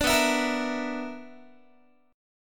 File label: B-Minor Major 7th Double Flat 5th, Guitar; BmM7bb5; strummed; 17,19,19,20,20,x